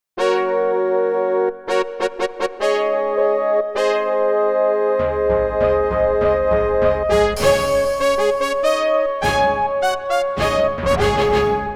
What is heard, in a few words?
normal Orchestra Hit; Gauntlet Victory